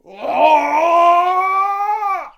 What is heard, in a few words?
samurai shouting; jidaigeki